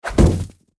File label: Snowball impact hitting a car